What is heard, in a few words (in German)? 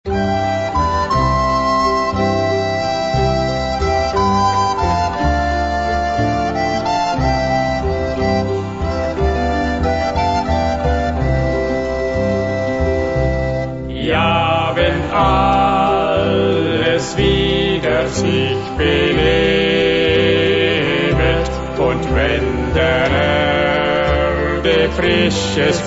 Andachtslied; Neuaufnahme 2006